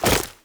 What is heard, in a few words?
Wall Jump Goo; Footsteps; WallRun; Warframe; Characters; Tenno